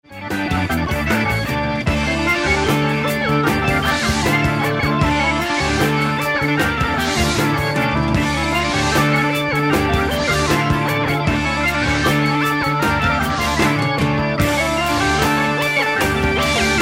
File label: Minimoog; Catherine Parr